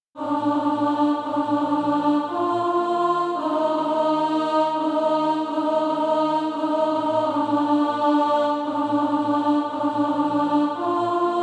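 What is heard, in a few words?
alt, Practise Music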